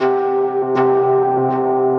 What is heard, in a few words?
Synth, Synth Lead; Skyline Synth; Ableton; Factory Packs; Punch and Tilt